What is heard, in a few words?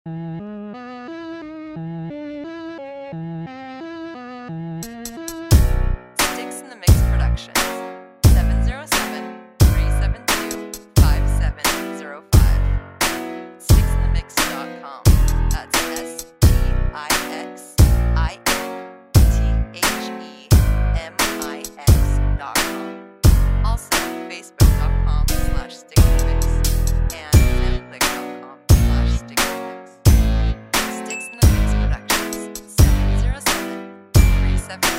Capriccio in B Minor